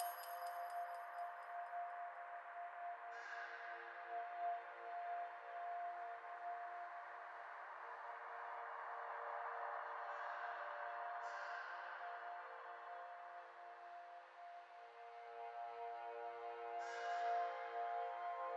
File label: level loop; vikings